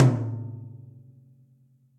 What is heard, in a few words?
Tom Mid Warm And Low; Drum Hits; Ableton; Factory Packs; Session Drums Club; MPE Hits